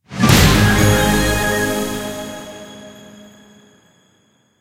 jackpot Level Up; irish pot luck

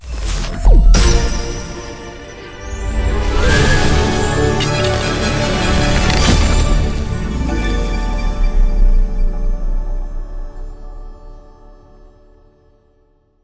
candy Win Reveal High; fairyhansel

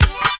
scatter On Reel; scruffy duck

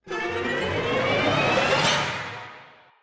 near win; turn your fortune

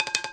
multiple soft hits, roulette; frenchroulette3

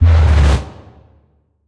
big Win End; arcane

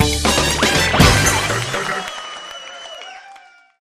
Outro Splash Jingle; scruffy duck

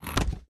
monkey Place; jumanji

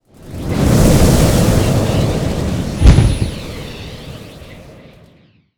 Music Freespin Intro Splash; godsoffortune

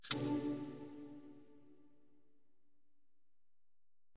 spin Button Quick Stop; fairyhansel

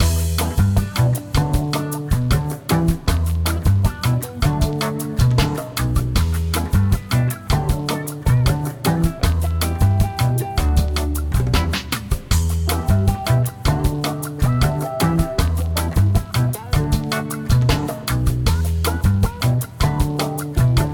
ambience Music, roulette; americanroulette3

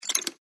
clear bet, blackjack

